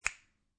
Finger Snap, Human, Finger Snap, Single Quick Finger Snap; Snapping; Snapping Sound Effects